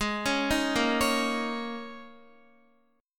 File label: Ab Suspended 2nd Suspended 4th Ab down Guitar; arpeggiated; Absus2sus4